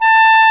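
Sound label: WIND B4; Roland LA; ORCHESTRA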